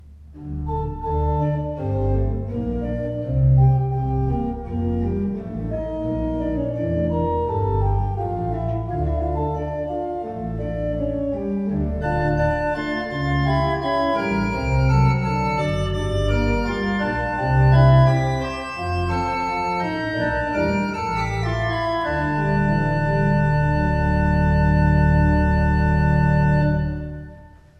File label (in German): Beckerath, gebrauchtorgel